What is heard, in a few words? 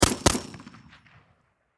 Machine Gun 7.62 Caliber Springfield M-14 Double Shot Distant Perspective; Warfare